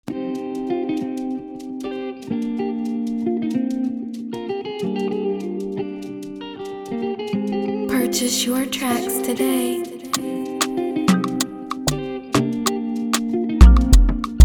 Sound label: 95bpm D major